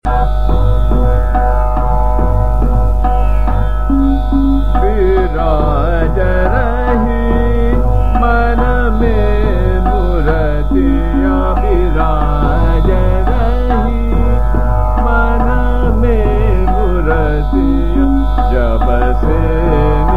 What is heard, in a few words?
Chota Khyal, Trital; Patdeep; Biraj Rahi Man Me Muratiya